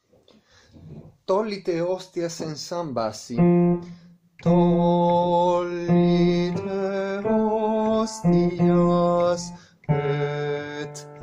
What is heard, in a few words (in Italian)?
bassi; Tollite Hostias